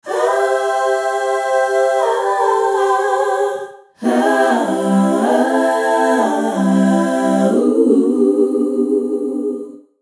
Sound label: gospel choir heavenly transition